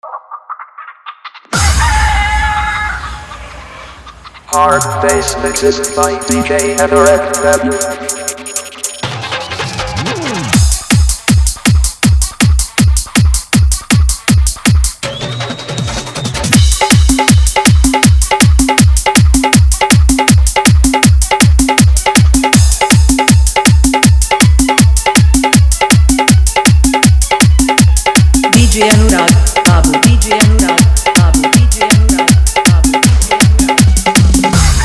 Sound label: Old is Gold Hit Viral Fully Dhollki Bass Remix